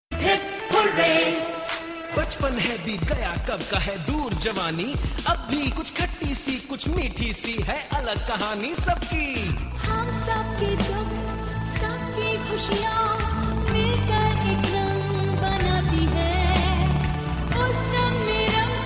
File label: Hip Hip Hurray